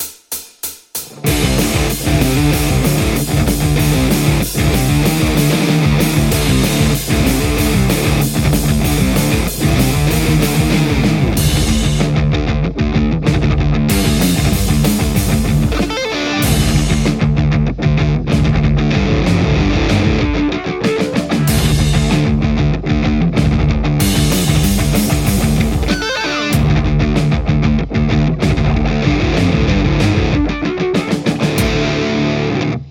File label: metal Les Paul; mercuriall; tomato